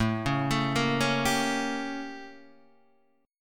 A 7th Flat 9th Guitar Open D

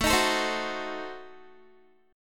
A Diminished 7th A down Guitar; strummed; tuning=4E,4A,5D,5G,5C,6F

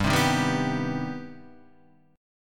C Minor Major 7th G

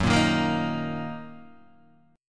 Fsharp 6th down Guitar Open D

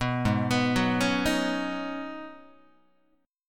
G sharp Minor 11th G sharp Guitar, chord; G#m11 arpeggiated; JGuitar

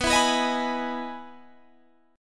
E Major 7th B, chord

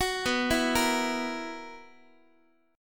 B Major 7th Suspended 4th, B, down, Guitar; BM7sus4; arpeggiated; x,x,9,9,8,9; 4A,4E,5A,5D,5G#,6C#

